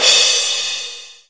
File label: cym crash